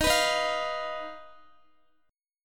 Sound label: Eb Suspended 2nd Sharp 5th, Guitar; Ebsus2#5; strummed